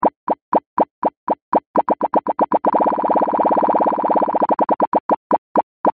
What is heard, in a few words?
loop